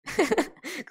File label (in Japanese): 笑い; ラブライブライブ